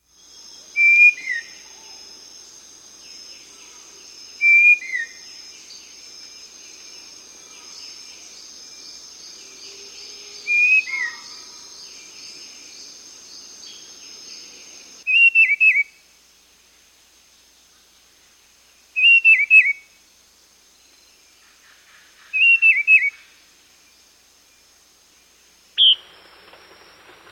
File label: White-Breasted Wood-Wren, S. America